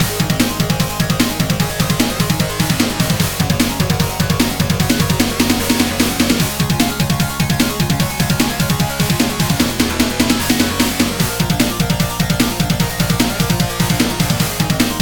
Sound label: Jingle - Super Transformation; Medallion From Metal Head